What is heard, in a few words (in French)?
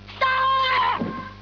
cri